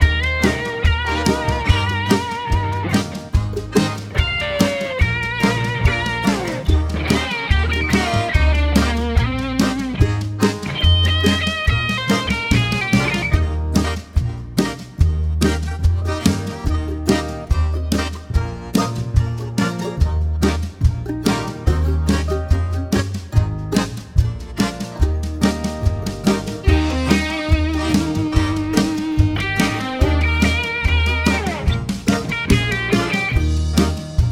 Eb Semi Quavers, brass

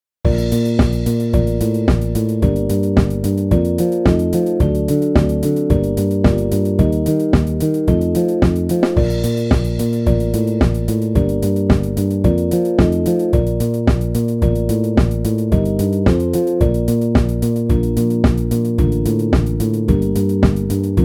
Bb Warm-Up Slur, brass

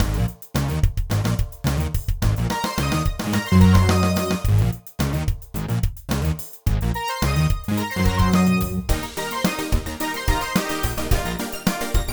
Eb Study 108, brass

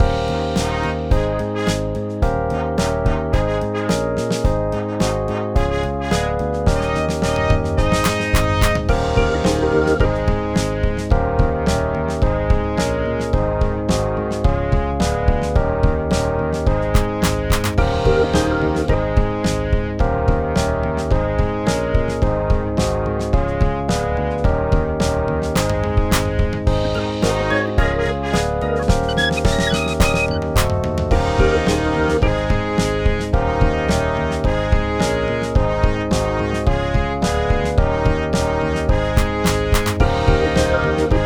Eb Moving Up F, brass

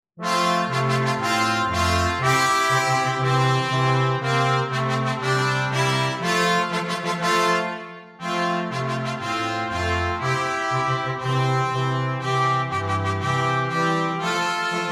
Bb Triplet Tune, brass

